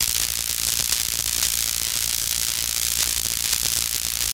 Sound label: Elect Arc Sizzle End, arcs & sparks